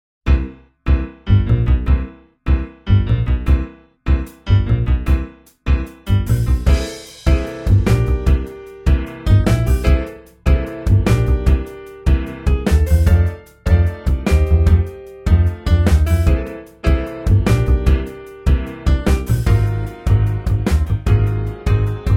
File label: Bluesy Rythm